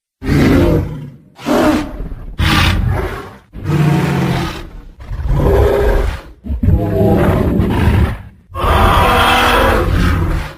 Tieng khung long gam

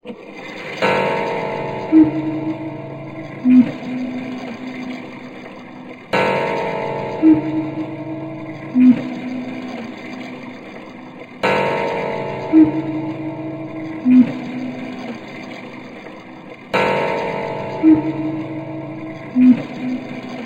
dong ho cuc cu keu dang so

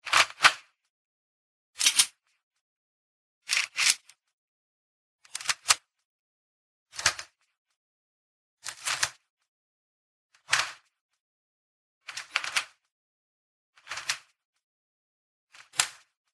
Tieng nap dan cua tat ca cac loai sung

